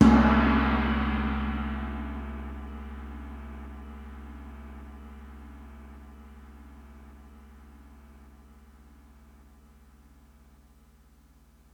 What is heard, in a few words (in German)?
Chin Gong